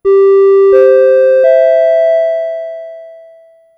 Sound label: Chime; Bosch; Chimes and Announcements